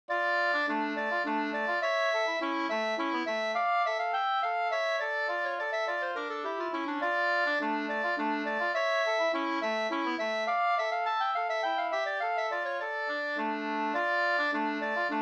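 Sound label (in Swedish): slangpolska polonesse, two-part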